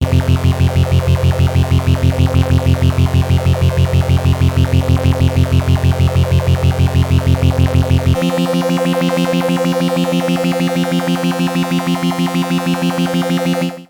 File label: prodigy stereo polyphase